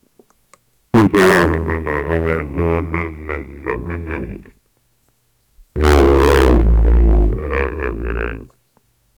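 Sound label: drowning boy underwater; submerged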